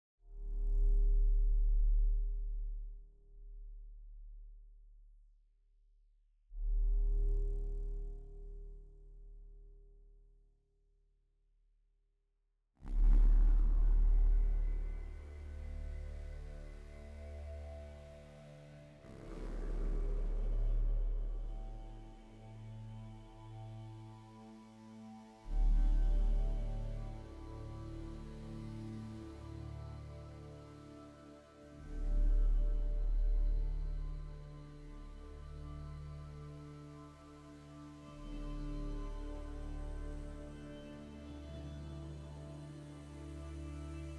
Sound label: Synths II & Pads